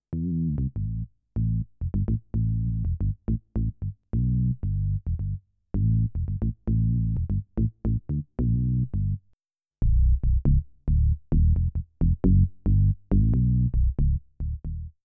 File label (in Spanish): DX01 Bajo; reface DX